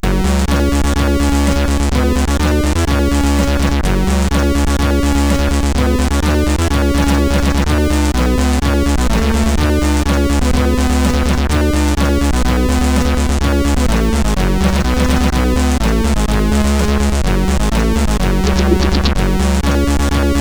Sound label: Intro, CSG8580; CSG8580R5